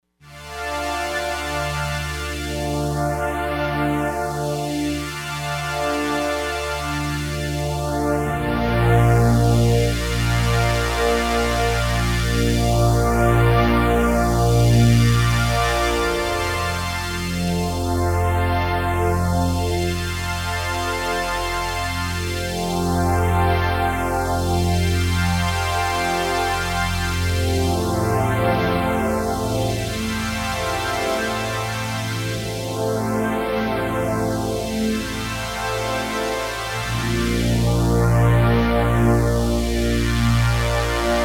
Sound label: Solina Phaze